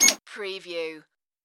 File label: Tui Peep